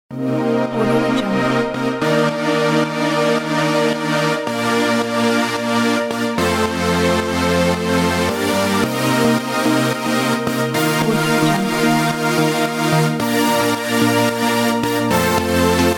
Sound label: synth pop 80s